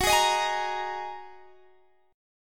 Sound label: Bb 7th Suspended 4th Sharp 5th Gb